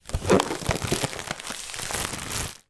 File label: foley plastic bag inflated movements with air release and bag squeaks